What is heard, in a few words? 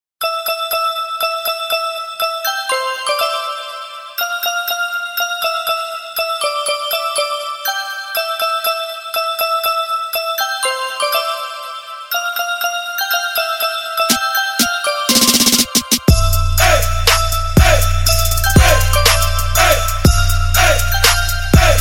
Christmas Trap Sweet Tone